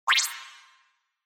Cyber

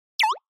Motion Pop

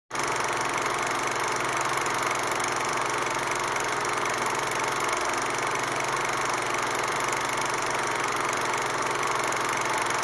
Movie Projector

